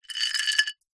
household necklace beaded pick up from ceramic bowl or surface